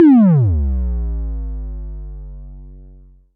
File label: Short, Kick, Traditional; Multi-Kick; Long